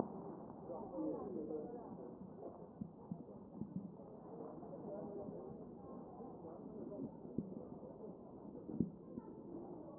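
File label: anterior chest, position 1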